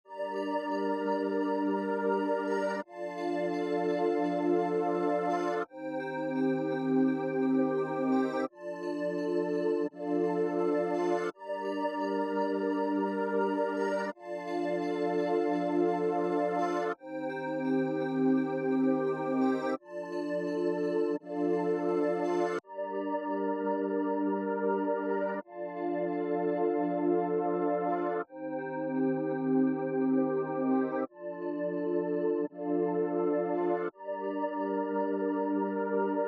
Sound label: 170 BPM G Min Pad; RnB; Loop Stems; Cymatics; All 4 U; 2022 Melody Collection